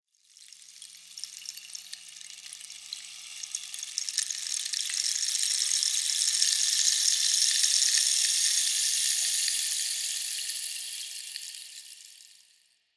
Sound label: Rainstick; FX